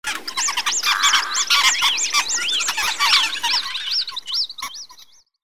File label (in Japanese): doubutu